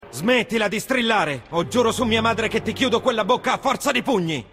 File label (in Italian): strillare